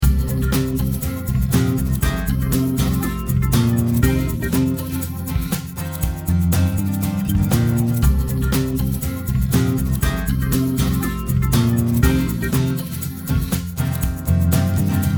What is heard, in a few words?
Song w/o Vox